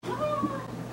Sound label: meow